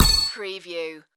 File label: game menu select